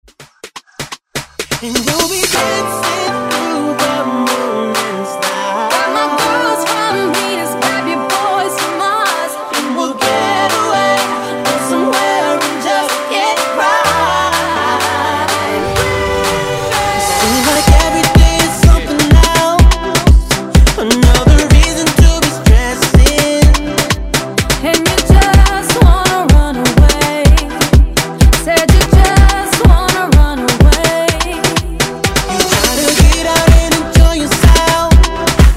Afrobeat Epic Intro

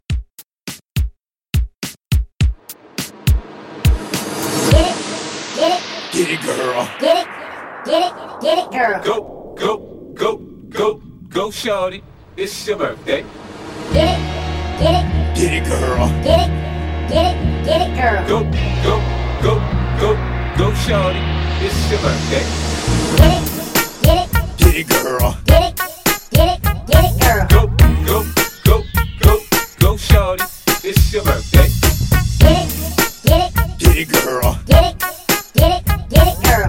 Old School Hiphop Mashup

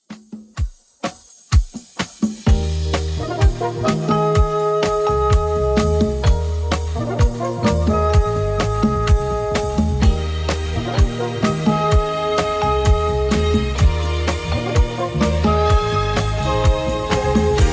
70s Redrum 127bpm

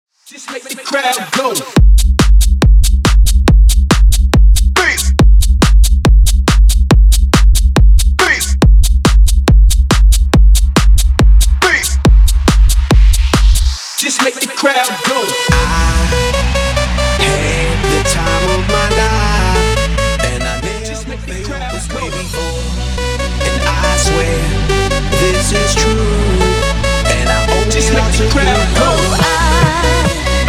mashup 140Bpm

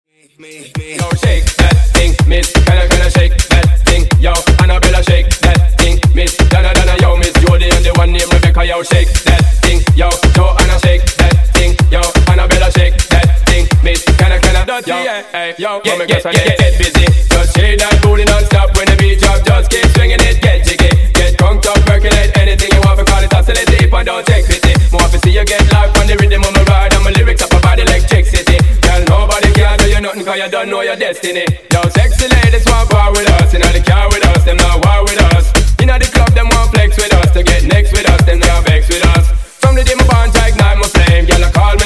Funk MashUp 125Bpm

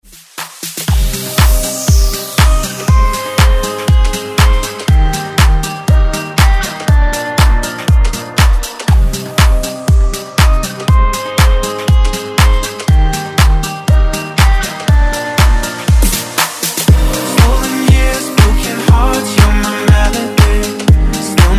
Pop Dance Redrum

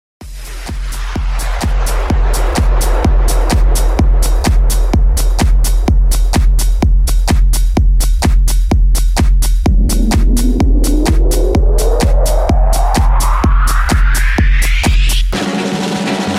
Party Club Edit 127Bpm Clean

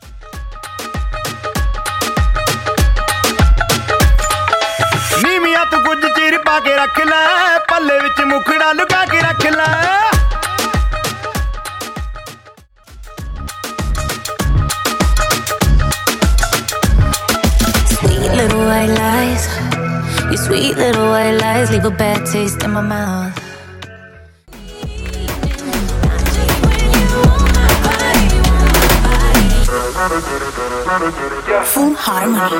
Moombah Megamix

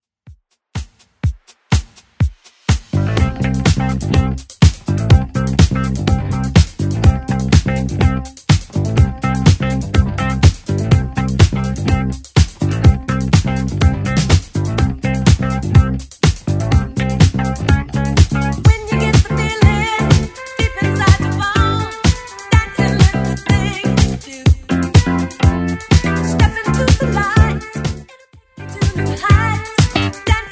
70s Redrum 124 bpm

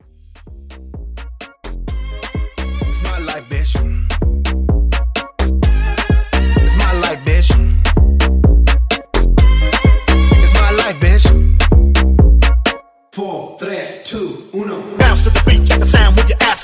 Pop Breaks 128 BPM

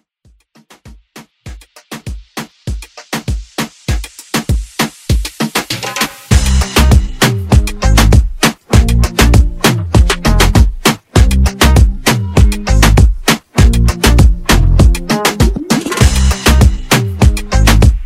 Moombah Redrum

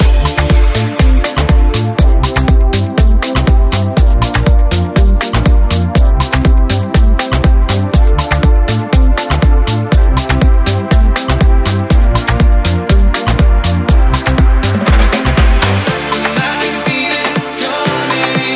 House Snippet 121bpm Gm

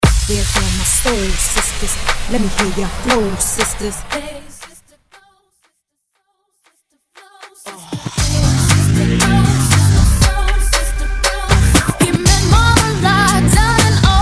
House Clap A Pella In; Mainstream VIP; Vegas Edit

